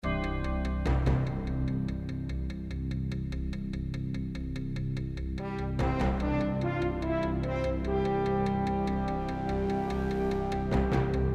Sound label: Hard Promo Bed